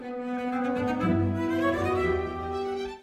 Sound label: CELLO